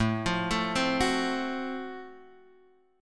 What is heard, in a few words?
Gb Minor Major 7th Flat 5th, chord